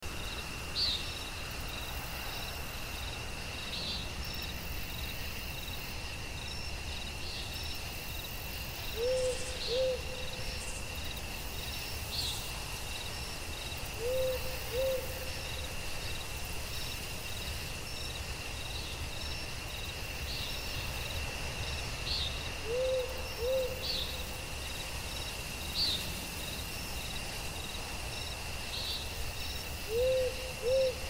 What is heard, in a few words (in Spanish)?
bosque nocturno, Forest Night Ambience